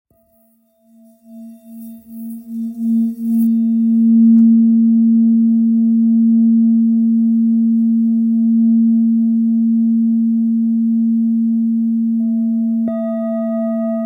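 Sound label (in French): Bol full moon la, bols chantants tibétains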